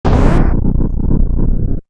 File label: Crash; Ganon in Bat form crashing through the Great Pyramid; Zelda